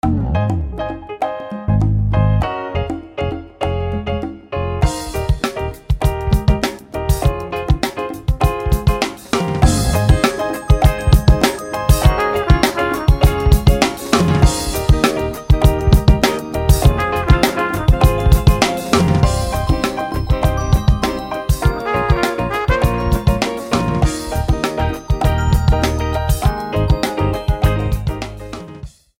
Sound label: Happy Piano; Infinix; XOS 10